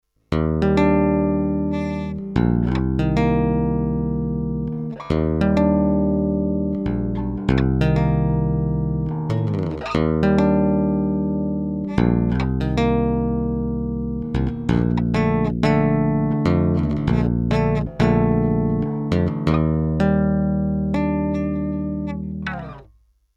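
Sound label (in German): JB-75 SB Vintage Series Tapping Bridge PU Tone voll auf, bass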